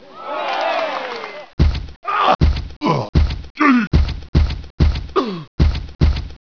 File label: boxing